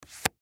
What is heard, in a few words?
Cards Flip Card, Cards Flip Over Top Card From Deck Slow; Cards (Sound Effects:Casino; SPORTS